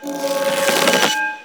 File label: Choir Bow Charge; Warframe